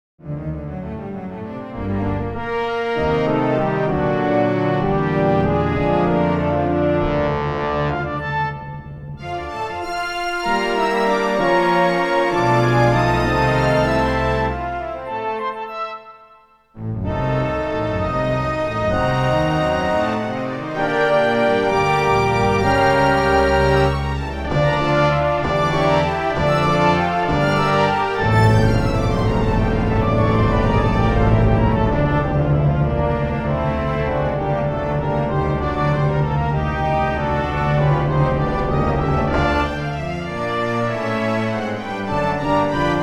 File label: orchestral